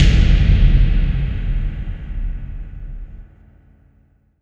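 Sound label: bass drum FX, dance drums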